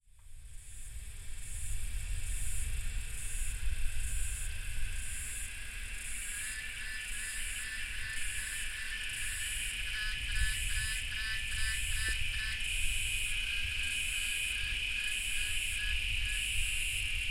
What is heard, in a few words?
cicadas thunder